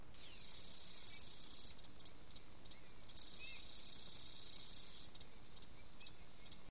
Orchelimum delicatum; Arthropoda

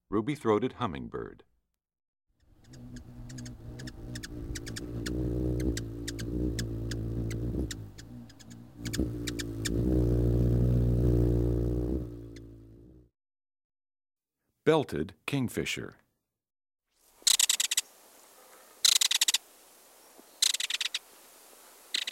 Ruby-throated Hummingbird, Belted Kingfisher; Bird Songs Eastern-Central

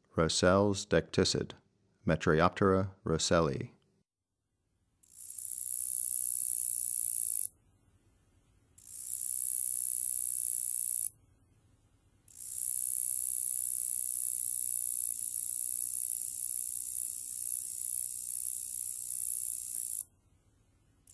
Roesel's Decticid, Metrioptera roeselii; Crickets and Katydids

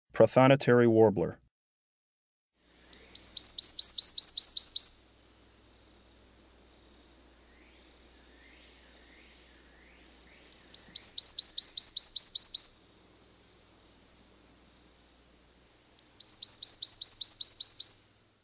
Prothonotary Warbler; Breeding Birds of the Chicago Region

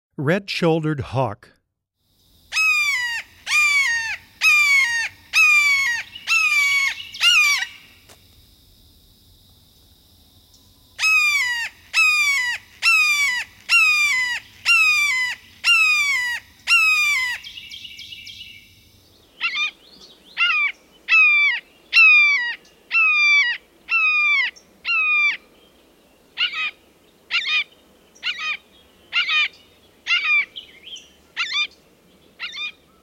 Red-Shouldered Hawk, Wildlife Sounds

